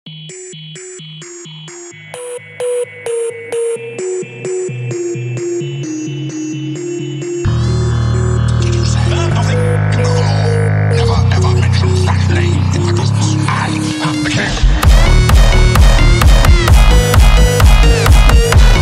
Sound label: hardstyle phonk